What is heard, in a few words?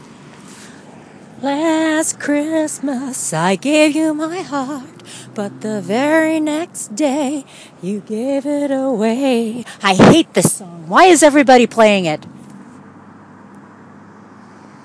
christmas music